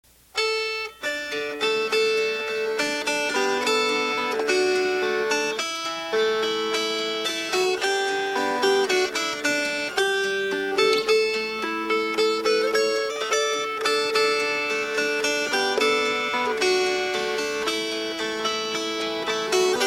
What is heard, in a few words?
Fingerpicked